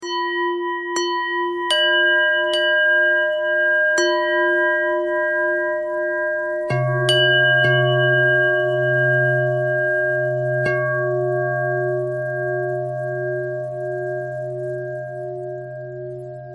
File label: bell bell thread noise